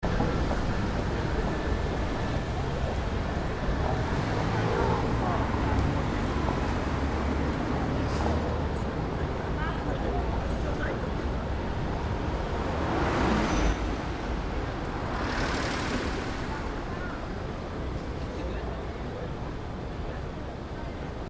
crowded street

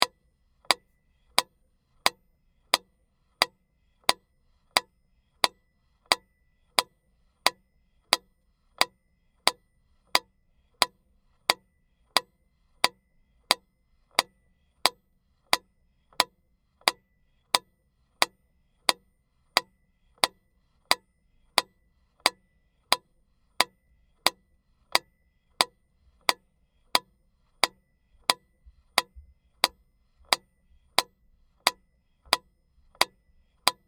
metronome

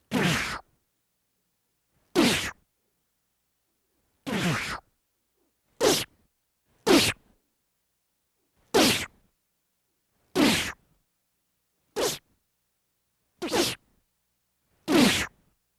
japan slashed by sword

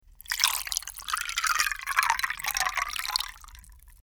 ice water

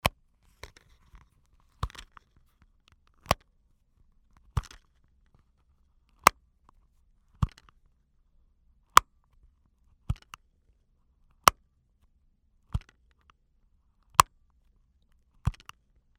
purse

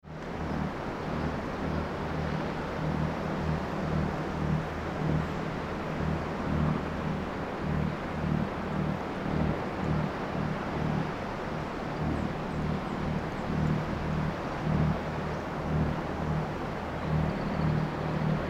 ship L to R

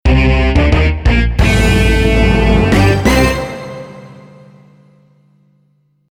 JINGLE pity

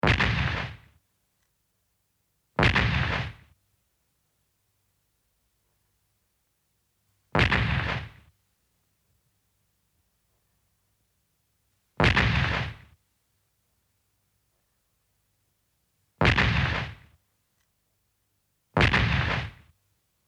japan cannon

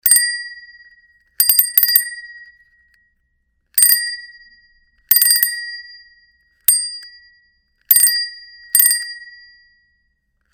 bicycle bell

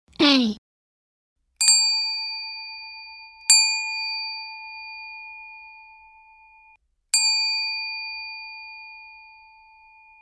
handbell

